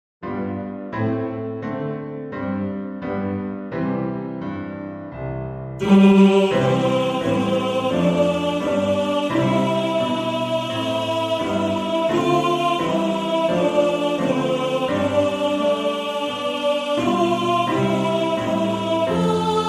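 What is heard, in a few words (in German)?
Tenor 1, Männerchor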